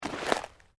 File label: PLANT LEAVES LARGE MOVE FAST, rustling; Material components for constructing more complex sounds